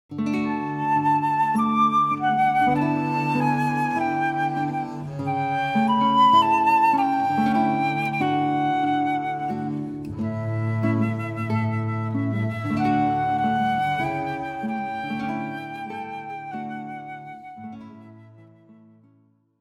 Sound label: Ave Verum Corpus, guitar flute bass trio